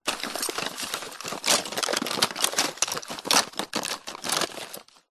household tub small containing odds and ends rummage; zapsplat